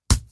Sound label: lemon hit; lemon drop